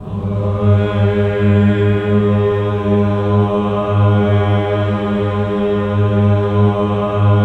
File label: VOWEL; Spectrasonics; Symphony of Voices; OCT VOWEL